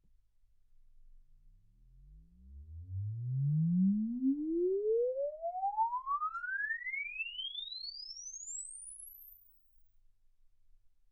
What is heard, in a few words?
exp swept sinus 10Hz 24kHz